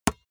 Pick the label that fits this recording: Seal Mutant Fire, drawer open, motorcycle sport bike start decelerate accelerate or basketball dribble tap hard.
basketball dribble tap hard